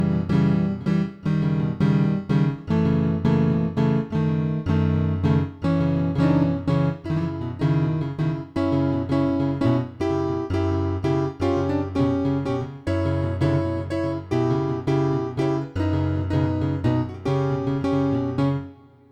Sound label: Train riff